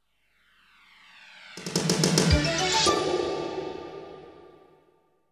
Jingles; 90 DDD Jingles

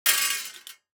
DICE on Metal, Throw and Roll, Mini, 6 Six Dice; Samples; ArticulatedSounds; DICE Sfx Party (Extended)

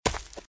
Footsteps - Leaves - Run - Right; RadwinIsland

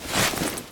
dive; firefight

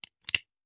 Hand Gun Foley; Sound Ideas; Guns

